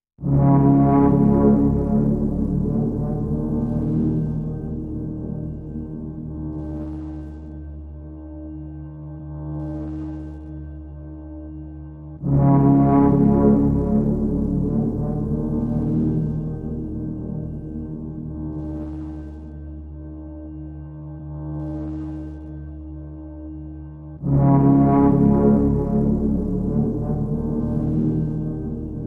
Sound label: No Drums No Risers